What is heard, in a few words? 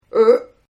Groan; Groaning; Voice; Partners In Rhyme